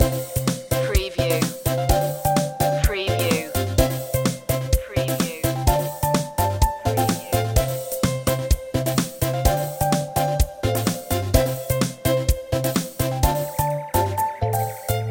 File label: playtime loop